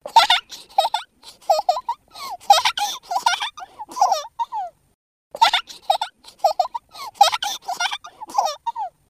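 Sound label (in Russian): ускоренный смех девушки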